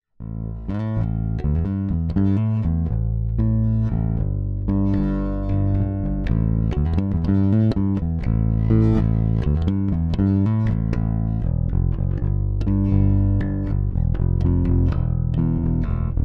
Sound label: Lakland 55 94 Deluxe Neck Pickup